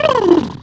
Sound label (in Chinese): 不鲁鲁, 综艺类音效